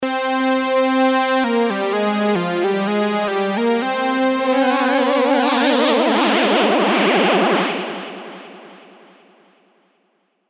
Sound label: classic lead